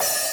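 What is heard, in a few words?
Open Hihat; osu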